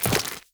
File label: Run Goo, footsteps; Warframe; Tenno